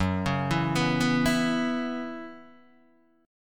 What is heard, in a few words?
F sharp 7th Suspended 4th, Guitar, DADGAD